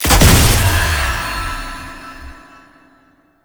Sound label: Jump Pad Launch Local; Gameplay; Warframe; Jade Shadows; Ascension